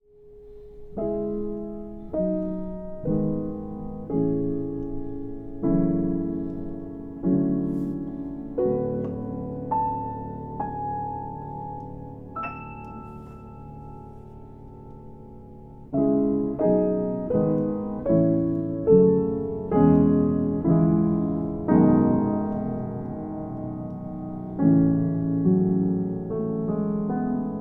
Piano Excerpt

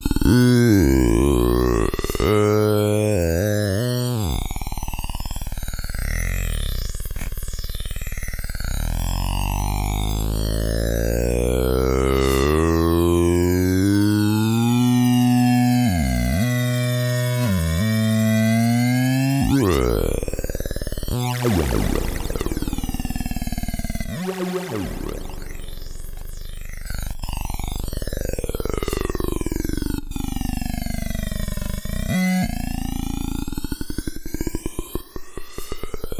OB6 Poor Baby

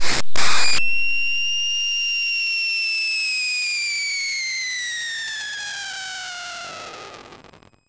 Power Off

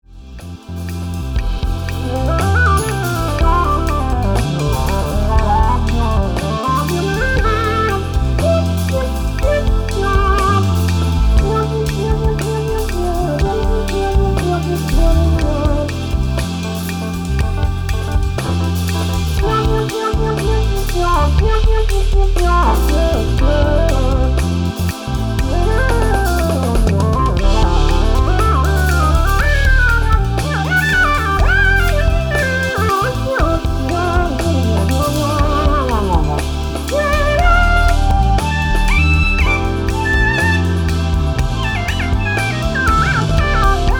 Jam

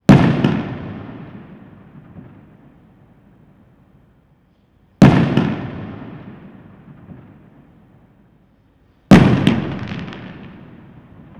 Bang!

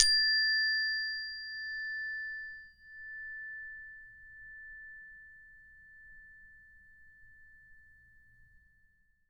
Harmonic Chime

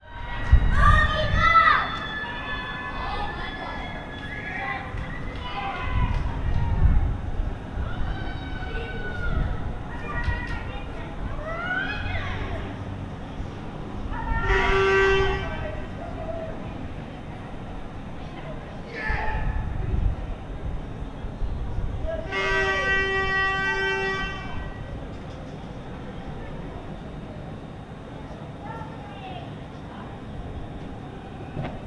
goal

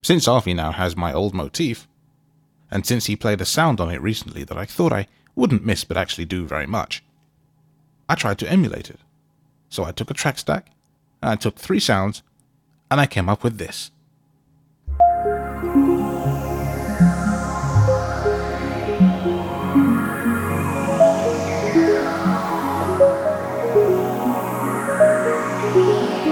Arfy-Like Motif Stack Sound

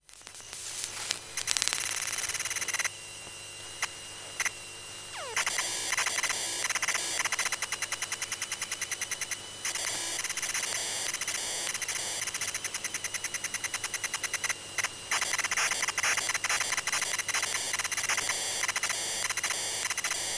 Apple Watch charge and boot, EM Pickup

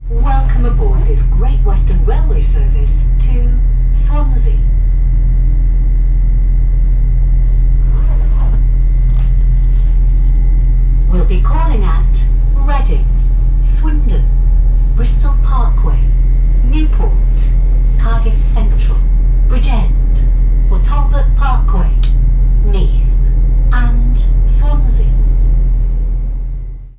Train to Swansea

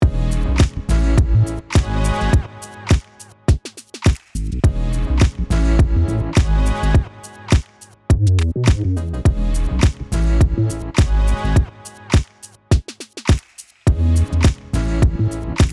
Approve of the Groove

